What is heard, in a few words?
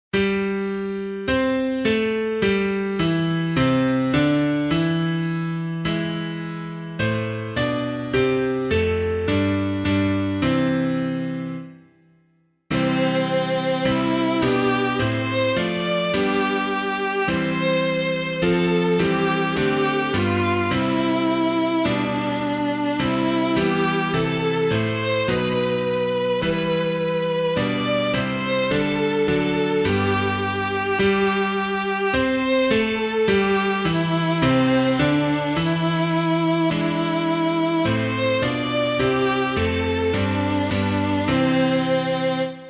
piano